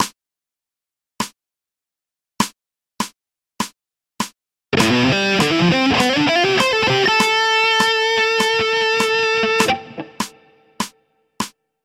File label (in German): Lick fast E-Gitarre; E-Gitarre Training; Fingerferigkeit